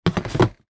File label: household empty deodorant can put into plastic bin